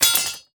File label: warrior drop sword; Aragami